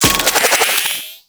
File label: Shield Vampire Blast; Warframe; Sentinel; Abilities